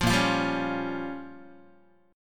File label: Bb Minor 6th, Guitar; 8,6,8,5,5,x; strummed; 4A,4E,5A,5D,5G#,6C#; chord shape